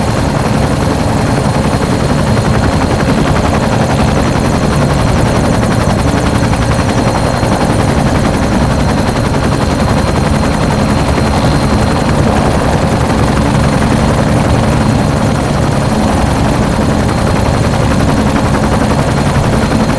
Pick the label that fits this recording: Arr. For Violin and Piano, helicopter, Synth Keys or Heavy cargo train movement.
helicopter